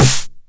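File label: 909 SD Tape, snare drum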